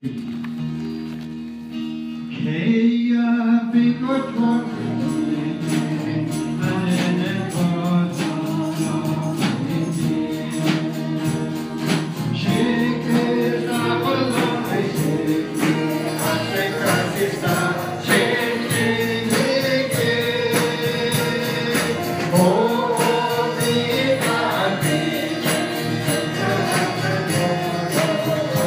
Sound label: Navajo Hymn